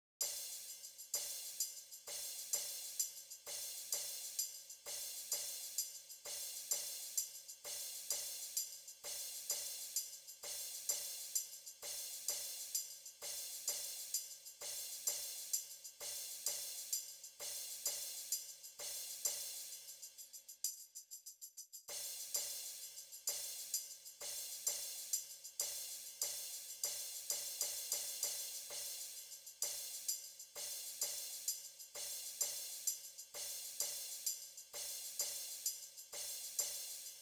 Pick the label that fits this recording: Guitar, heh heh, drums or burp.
drums